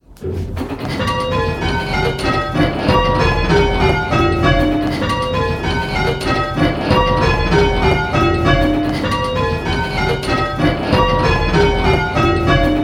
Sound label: Ionian, bells; Major